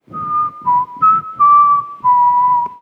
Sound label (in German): pfiff